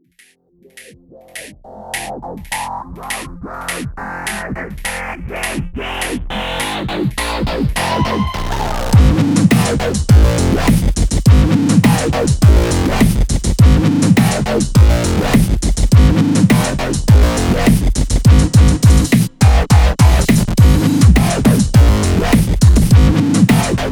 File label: glitch hop; TEASER